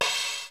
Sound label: Kawai r-50; Drums